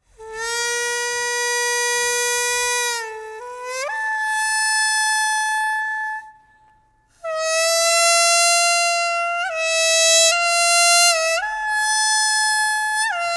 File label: hitiriki